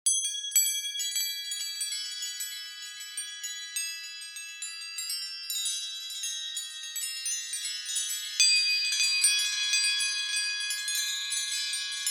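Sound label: small windchimes gentle metallic synthesized, household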